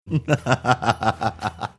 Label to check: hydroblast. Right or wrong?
Wrong